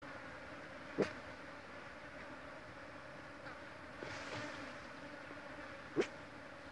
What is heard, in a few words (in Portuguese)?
Som Urubu Rei